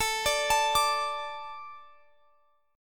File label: D 5th A